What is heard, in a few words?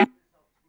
Perc Lo Speaker, Stylophone Beatbox